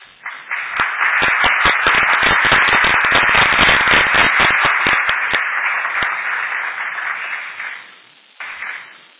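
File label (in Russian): аплодисменты небольшой группы людей затухающие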